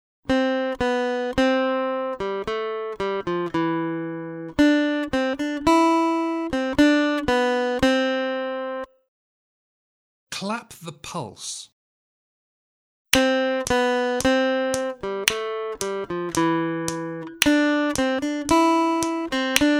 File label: Aural Rhythm Test, acoustic guitar; Grade 2